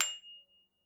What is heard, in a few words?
ding soft, dings